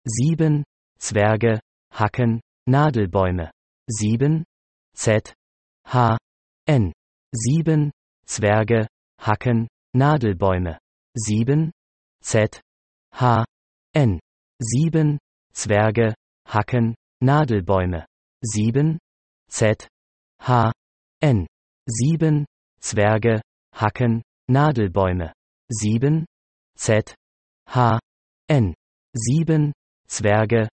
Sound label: full loop